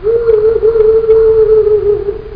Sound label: Barn owl hooting ambience